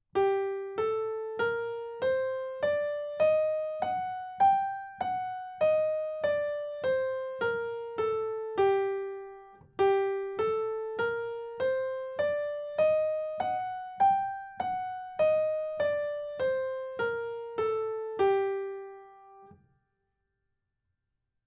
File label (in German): Harmonische g-Moll Tonleiter Übung